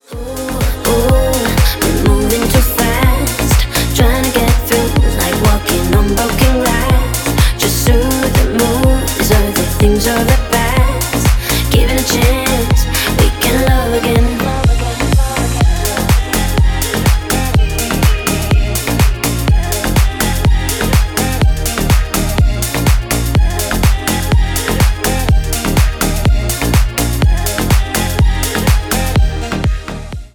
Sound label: remix